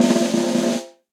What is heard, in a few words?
Snare Press; Session Drums Studio; Drum Hits; Standard Hits; Snare Articulation; CopStyle